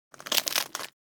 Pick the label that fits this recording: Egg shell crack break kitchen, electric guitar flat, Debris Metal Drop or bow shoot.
Egg shell crack break kitchen